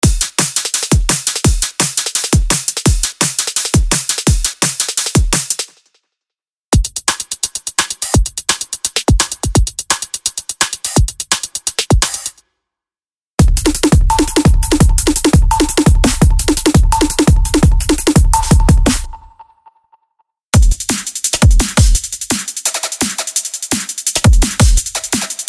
Drum & Bass Drum Track; Unison Drum & Bass MIDI Drum Collection

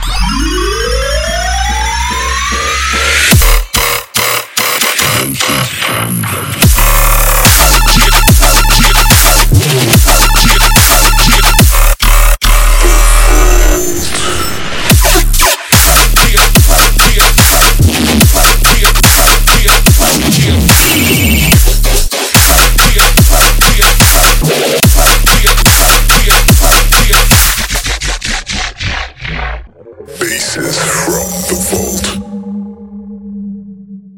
riddim; MOONBOY & K-NINE Basses From The Vault; Dubstup

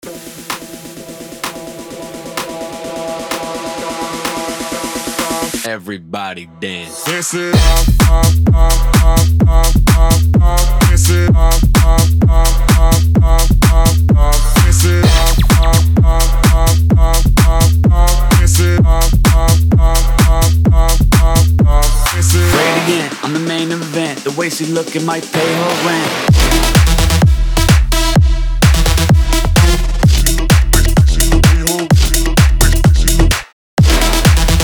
Tech And Bass House Vocals; Tech&Bass House; Euphoric Wave Bass and Tech House Vocals Vol.1

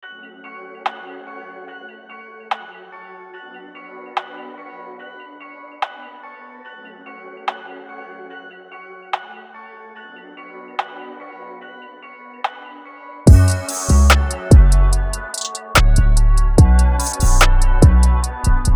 Beat; AngelicVibes Highway Kit; Hip-Hop; Highway Sound Pack Demo Beat

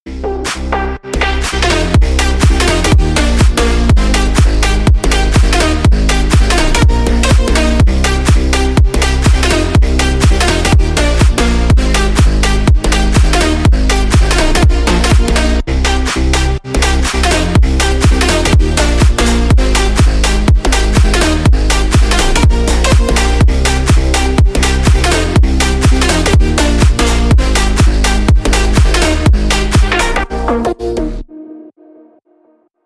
Drop, Bass House & Future Bounce; Disformity Bass House & Future Bounce

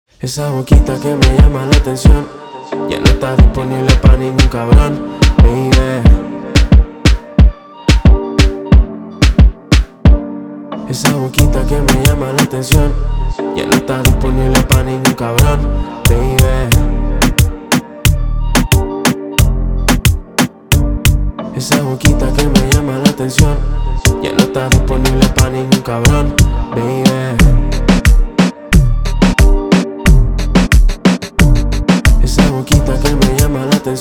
Reggaetón Soundbank; Nébula – Reggaetón Soundbank Vol. 03 by Antian Rose; Antian Rose制作的Kicks&Drum Loops套件包

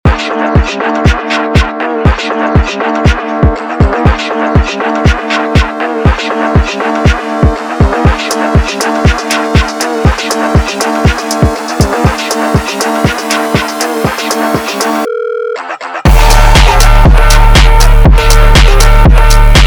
phonk, Phonk House; Cymatics PARADOX - Phonk Collection

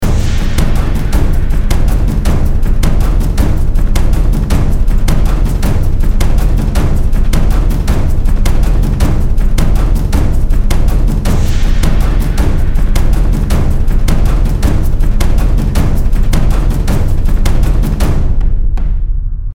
passion, Modern Percussion; Audio Ollie LA Modern Percussion 1.1 KONTAKT

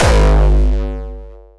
Kick G#; Industrial Strength; Gancher and Ruin Drumshot Ammo; Hardstyle&Rawstyle; 鼓声

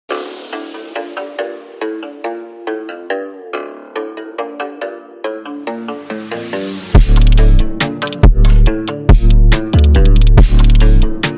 Trap; TrakTrain; Neo Warrior Trap Sample Pack Vol. 2; Japanese Trap

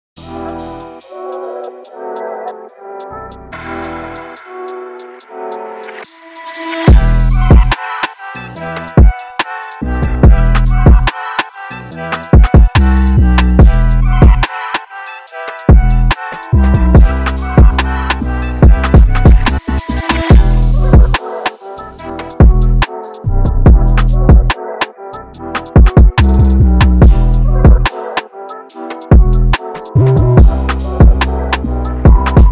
drill; Cymatics - PARADOX Drill Collection

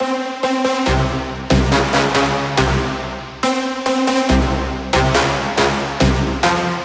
Brass Elements, 140BPM, Cmin; Trap; Monosounds Go2 Premium Beatmaking Sample Pack & Serum Presets

